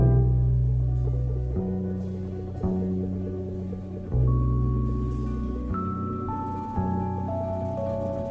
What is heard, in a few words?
bass, Sad